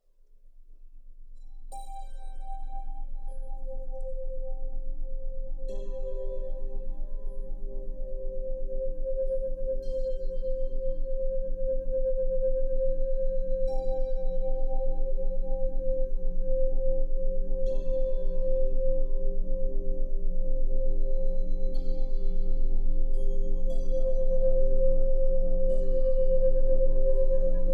20 Hz Rife Frequency With 528 Hz Solfeggio Frequency